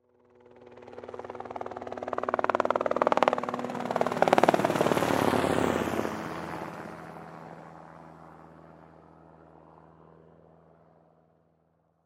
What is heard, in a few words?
Helicopter Fly By; Transportation Aircraft; Helicopters; Vehicles